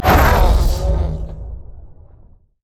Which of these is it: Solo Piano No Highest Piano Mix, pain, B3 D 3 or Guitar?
pain